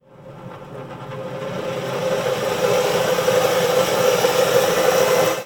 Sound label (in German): Hochlauf mit defektem Rillenkugellager